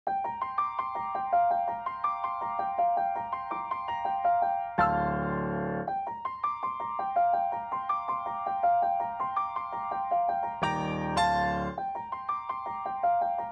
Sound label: piano A, Smooth RnB; 082 Gmin; Big Fish Audio; Legendary; Shes Mine